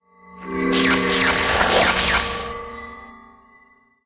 stone dead

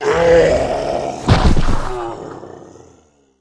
fleshgolem dead, monster

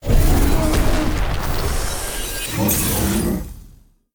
boss earth wind; spiritking

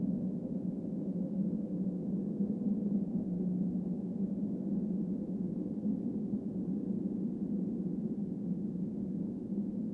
water, jungle

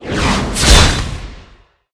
night leader attack, monster

